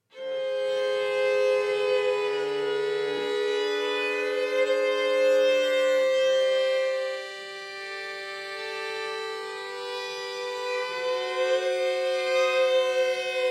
string quartet; IV. Coda - Into the Light

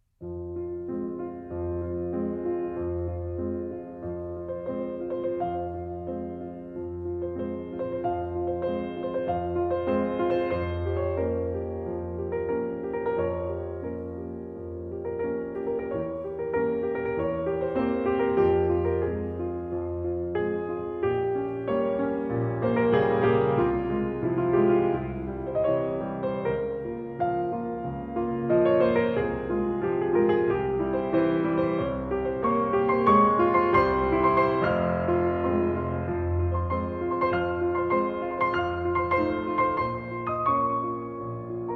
fantasie in f minor

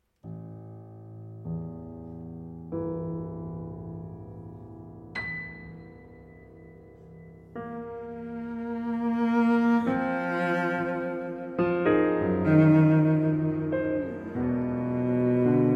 eclogue andante con moto; Limbo Tunes

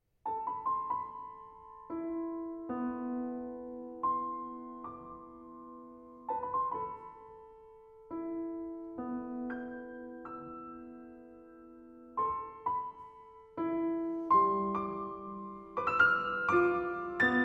for piano solo

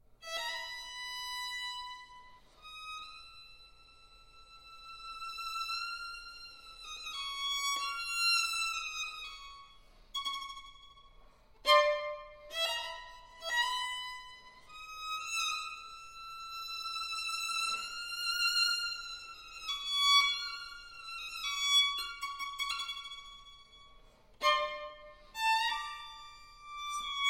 pinkillo llorando crying pinkillo flute

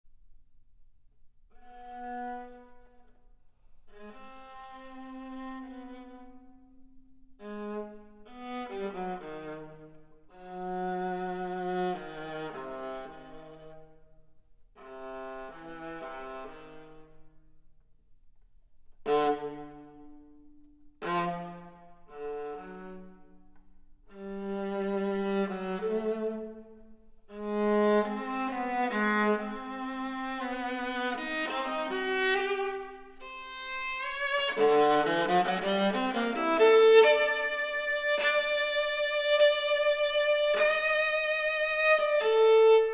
sonata for viola and piano

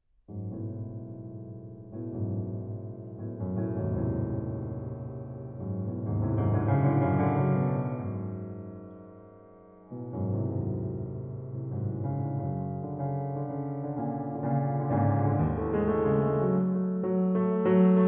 for violin and piano

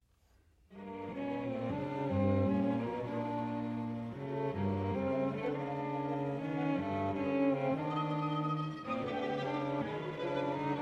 string quartet allegro molto; string quartet no. 2 iii

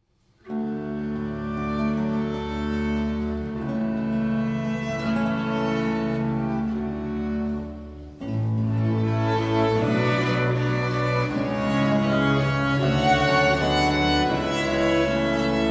sonata in e flat major

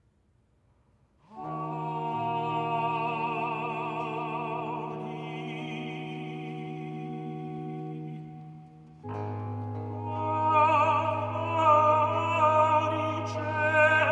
motet for one voice and at the end for six voices; Vespers of 1610; Audi coelum

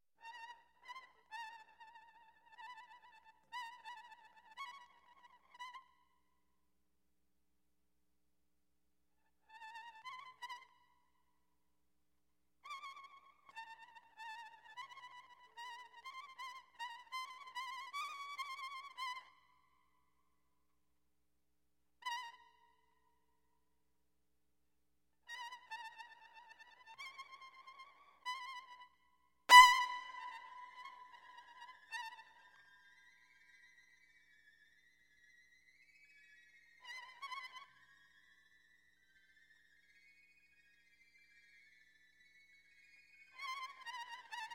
violin

